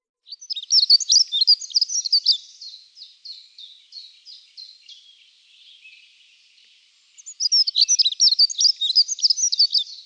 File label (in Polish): POKRZYWNICA, birdsounds